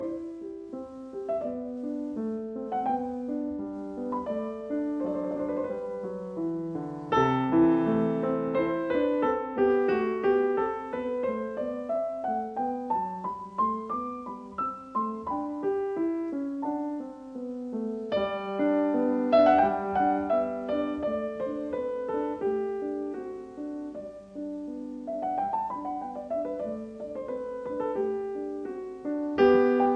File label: Piano Sonata No 5 in G major K 283 II Andante; Lili Kraus